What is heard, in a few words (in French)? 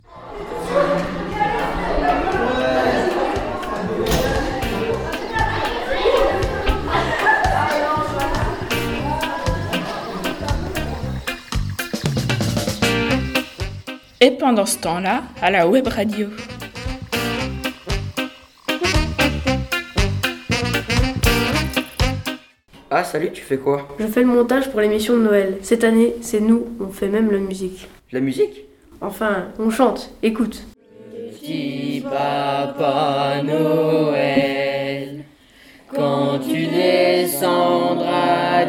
chorale noel